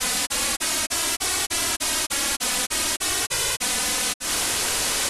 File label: e repeated noise; Pitch; Melodia